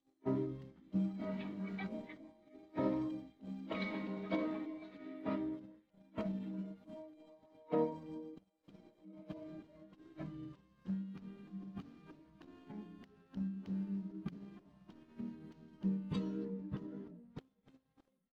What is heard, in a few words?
THAI STUFF guitar